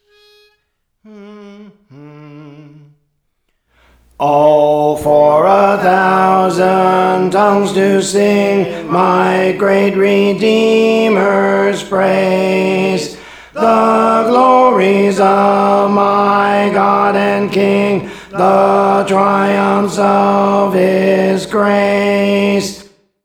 Tenor, Songs; Praise For The Lord Training; Azmon

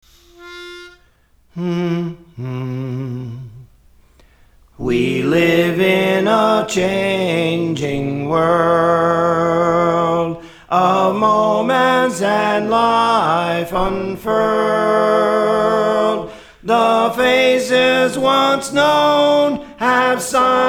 Soprano; Praise For The Lord Training

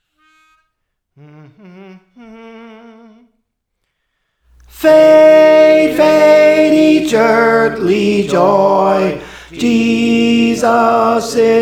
Tenor; Praise For The Lord Training; Jesus Is Mine

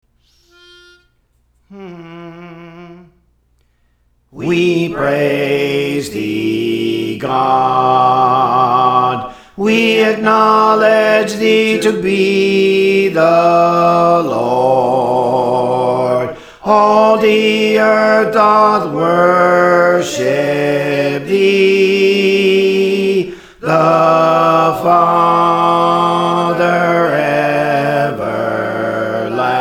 Bass; Praise For The Lord Training

